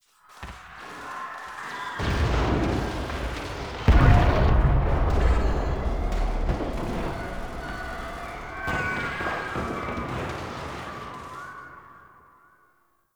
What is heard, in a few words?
Ambient Battle Distant; Warframe; Tau